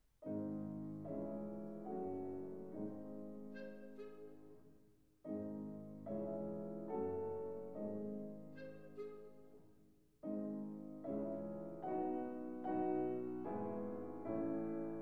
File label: clarinet